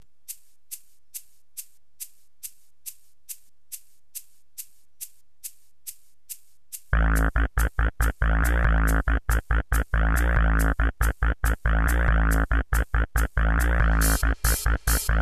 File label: Ravesignal